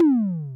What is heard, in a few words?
Tom Hi Pitch; Drums